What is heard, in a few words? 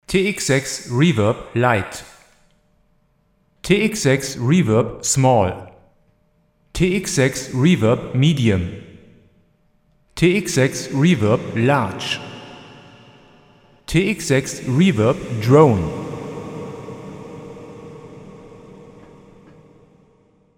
Teenage Engineering TX 6 Reverb